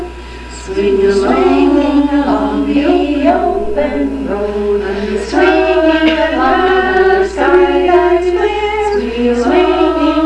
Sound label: swinging; Guidingsongs